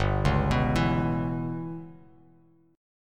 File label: G 6th Flat 5th, Bass Standard, G6b5 chord; G6b5 arpeggiated; JGuitar